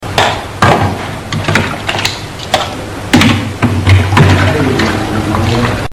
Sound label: crafting; sven; tangpu ice room